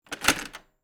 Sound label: Cassette eject